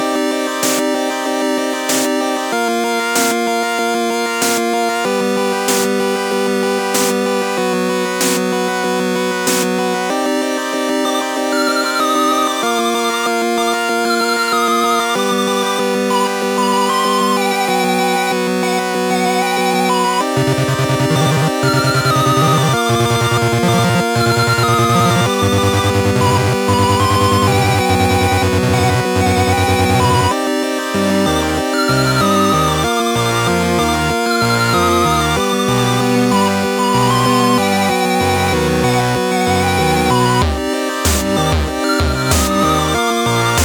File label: Haunted house